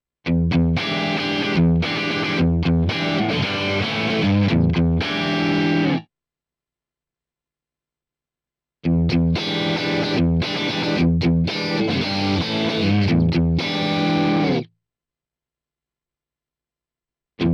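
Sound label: Crunch SC1 Single coil, pickup; v series v155t 5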